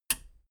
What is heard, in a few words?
metal button radio release power on